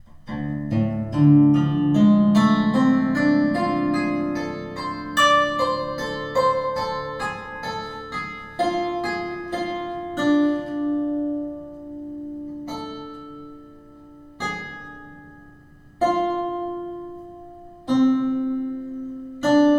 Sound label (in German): Pentatonik Hemitonische Beispiel; Martin Kucera